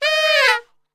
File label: D#3 SAX SHF, Sax; Giga Samples Collection